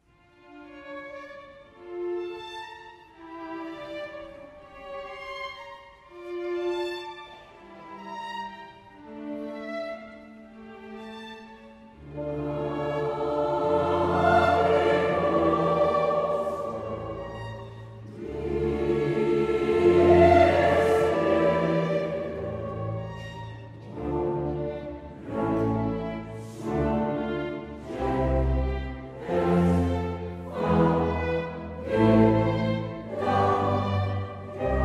سمفونی; موتزارت; Wolfgang Amadeus Mozart

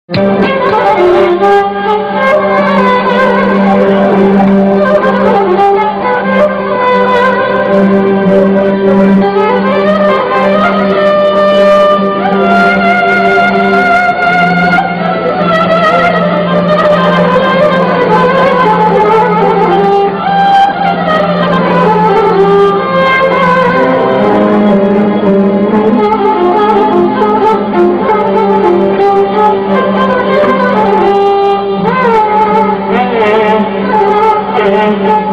ویولن

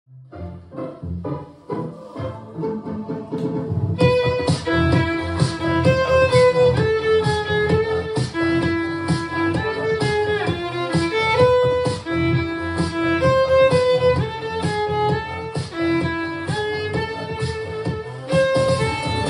صدای ویولن; ویولن سریال ونزدی; Wednesday

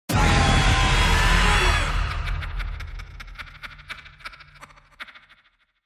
صدای ترسناک کلبه وحشت; cottage of horrors

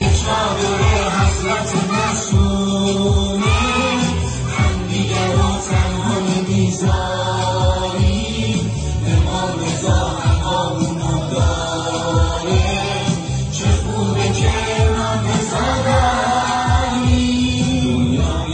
سرود; حضرت معصومه

